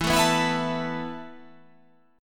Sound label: E Suspended 4th E, down